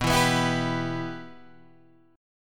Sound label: B Suspended 4th Sharp 5th B Guitar Half Step Down